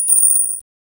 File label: fast sequential sound of, coins